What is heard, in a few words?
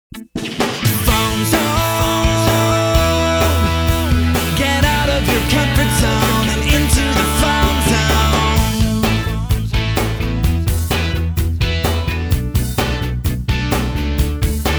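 telecommunication jingles, acapella jingles; Phone Zone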